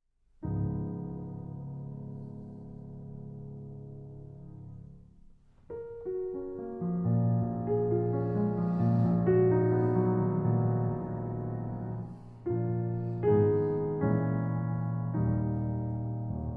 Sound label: Satie 3 Sarabandes No. 3 in B-Flat Minor